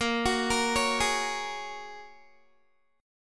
Bb Minor 7th Sharp 5th, Guitar; Bbm7#5 arpeggiated

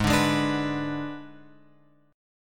G sharp Diminished G sharp; G#dim Chord {4 5 6 7 X 7} strummed

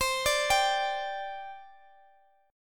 C Suspended 2nd, C, down, Guitar, Open G

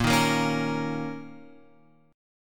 A sharp Minor Double Flat 5th; A#mbb5; {6 6 8 6 X 6} strummed

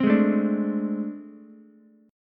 A Minor Major 7th Double Flat 5th, down, Ukulele; strummed; JGuitar

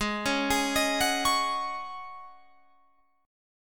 Ab 7th Suspended 4th Ab down Guitar Open F, Chord; Ab7sus4 arpeggiated

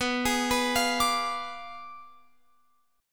B Diminished 7th, down, Guitar, Open F; arpeggiated

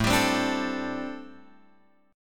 A Minor 11th A Guitar Open F; Am11 strummed; JGuitar

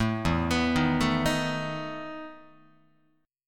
E Major 7th Suspended 4th E down Guitar; arpeggiated; 4A,4E,5A,5D,5G#,6C#; JGuitar

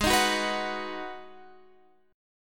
G sharp Minor 7th G sharp; G#m7 strummed

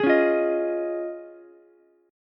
Db Major Add 9th, Ukulele, C6; strummed; JGuitar

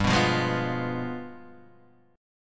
G 7th Flat 9th, Guitar, Open D

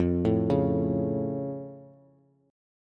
F Augmented, Bass; arpeggiated; x,8,7,6; down

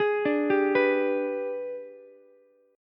Ab Minor Major 7th Flat 5th, down, Ukulele; AbmM7b5; arpeggiated

